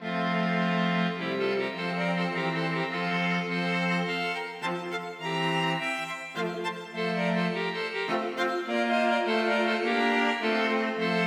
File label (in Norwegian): Menuet for strykekvartett; Aamodt